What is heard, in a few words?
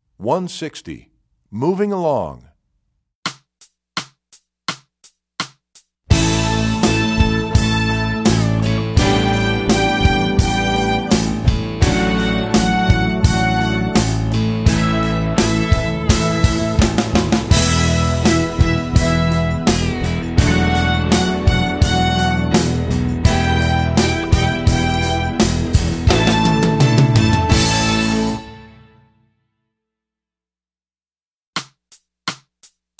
Moving Along, Strings; EE2000 Book1